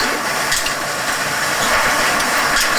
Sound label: Run, Train; OpenBVE; Cl313 Silverlink